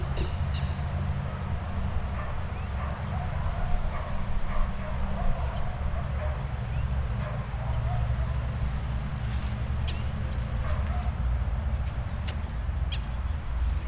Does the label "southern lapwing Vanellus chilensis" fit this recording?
Yes